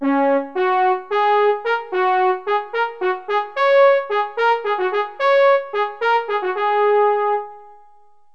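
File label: french horn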